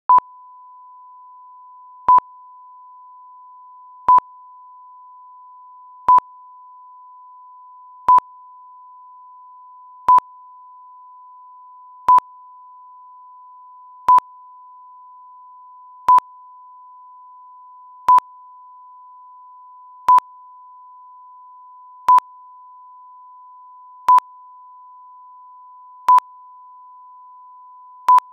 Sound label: Compressor Test; Rohde & Schwarz; UPA; Rohde & Schwarz UPA-CD